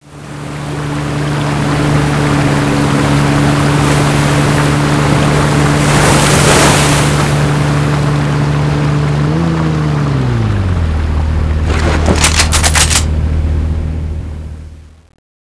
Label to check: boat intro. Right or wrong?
Right